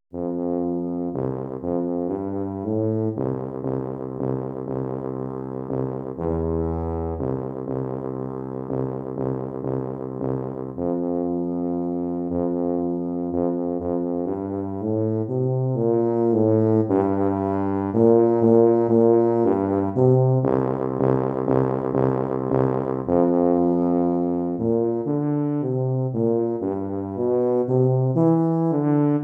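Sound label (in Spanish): danza cortesana para trombón tuba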